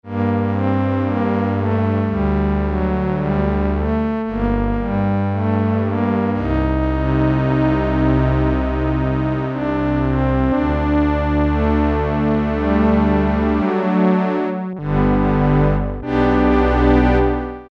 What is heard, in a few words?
Korg Poly-800, Low Strings